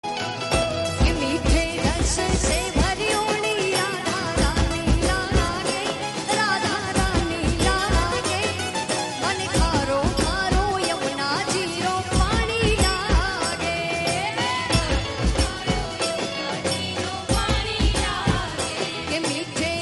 radhe radhe gujarati song

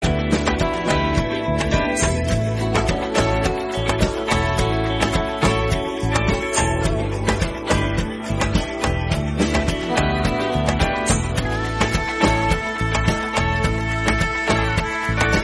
song instrumental

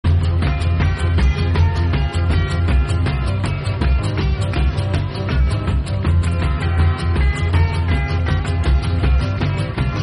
instrumental bgm

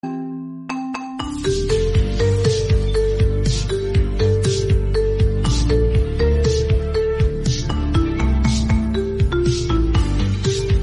marimba remix instrumental

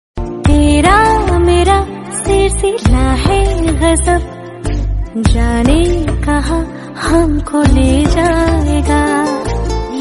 hindi love

